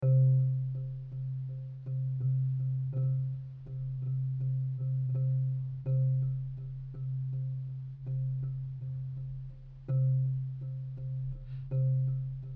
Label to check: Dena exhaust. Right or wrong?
Wrong